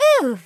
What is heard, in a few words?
Oof; Teto; SM64 CO-OP; Triple Baka Pack